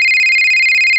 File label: cellphone ring